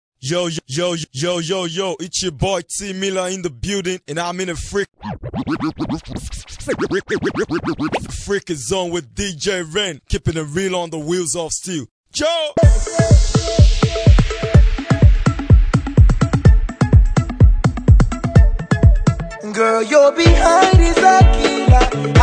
Thunder Mix

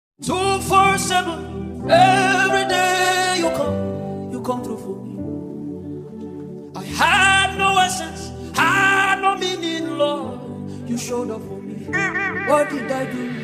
Drill Version

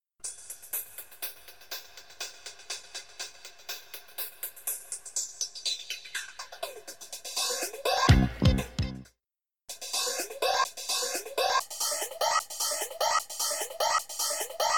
Hi-hat loop with flanger

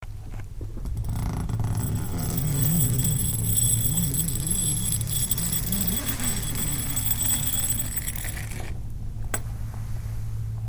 tent zip

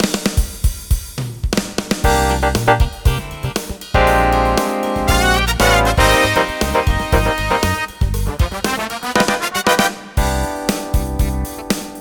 funky

